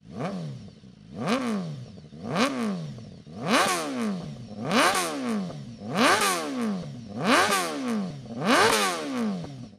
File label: remus hyper cone slip on exhaust bmw s1000 rr